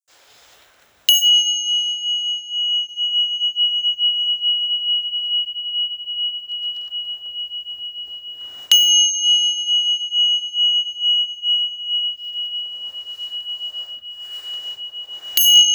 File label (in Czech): tibetske cinelky stredne bronzove draci